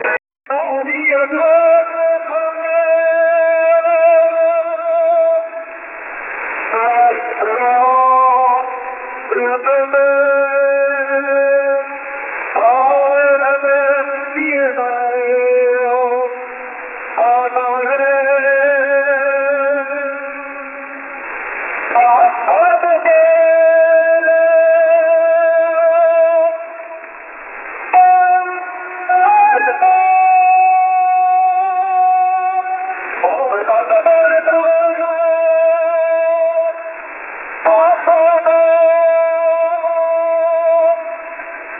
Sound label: music radio 1940s pakista